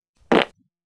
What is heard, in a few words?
playful fart noise